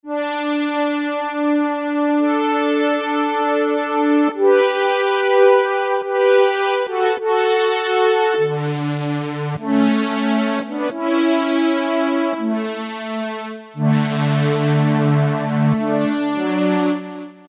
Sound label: Solemn Brass, Korg MS2000; Factory Patches